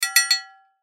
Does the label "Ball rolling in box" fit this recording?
No